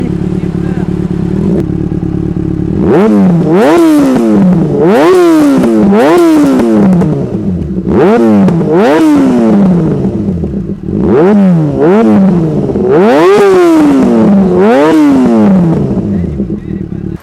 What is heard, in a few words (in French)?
fz6 akra chicane, sons echappements